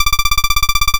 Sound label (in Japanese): 会話; nes retro talk sound effects